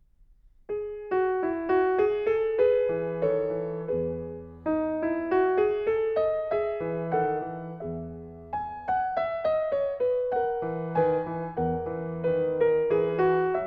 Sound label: Menuet